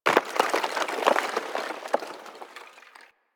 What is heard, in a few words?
Landscape Ice Slide Medium, Ambience; Warframe; Venus Open World; One Offs